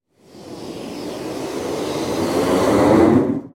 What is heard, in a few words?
Slow Whoosh Flying Th; swish, wire